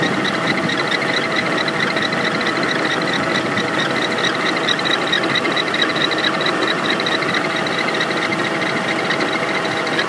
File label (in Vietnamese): Máy quay nước Juicer